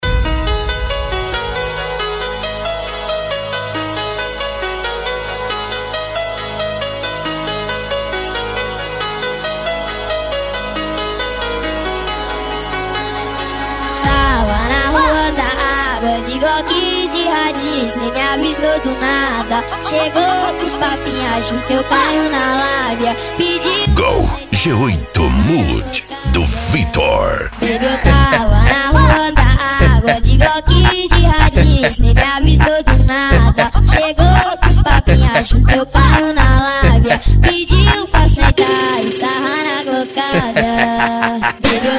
funk ritmado

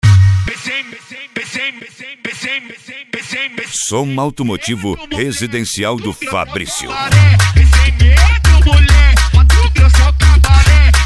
som automotivo funk bass

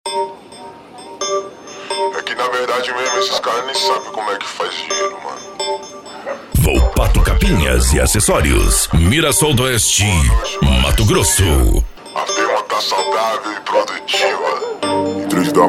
funk consciente e hip hop

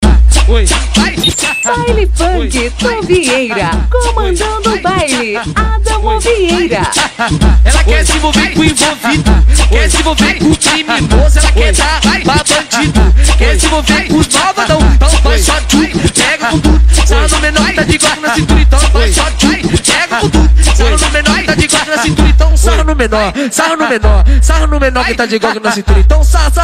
baile funk

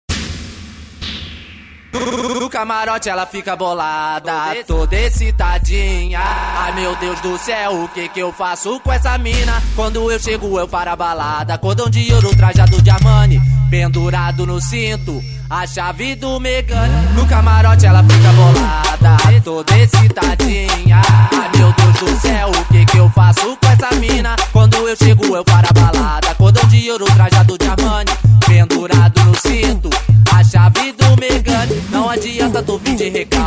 f250 fabulosa especial funk bass

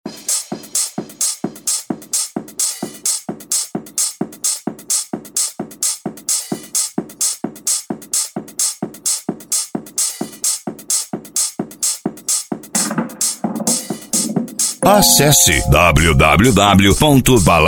eletrofunk

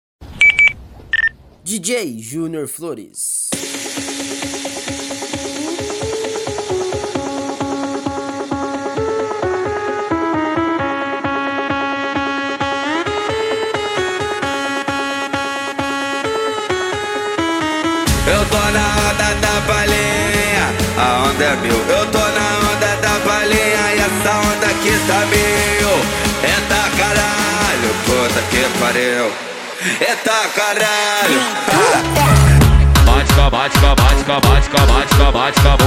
funk rave; projeto feste funkrave

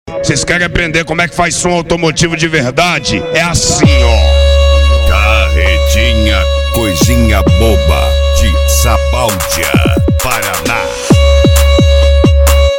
melo do violino; paredinha coisinha boba

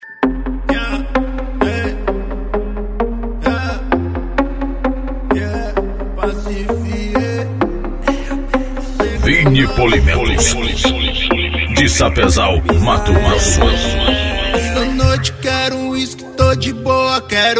funk e rap

